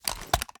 wfoly plr sm reload xmag start, iw8; gmod; uzulu

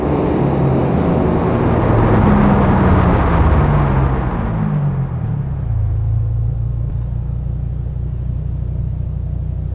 throttle off, vehicles; gmod; tdmcars; pt cruiser

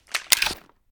inspect up, reloads; gmod

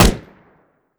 weap famas fire, weapons; gmod; viper

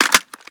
reload empty magin; gmod; viper; weapons

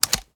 reload empty fast mag out; reloads; iw8; uzulu; gmod

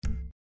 back, pausemenu; gmod; paris

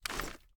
inspect, reloads; gmod

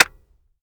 disconnector; viper

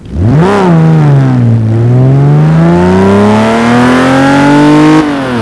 first, vehicles; gmod; tdmcars; gullwing

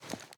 reload magout cloth; reloads; gmod; iw8

